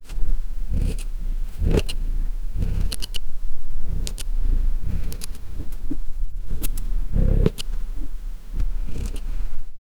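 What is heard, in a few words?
asmr sound of cat sniffing; domestic animal; domestic animals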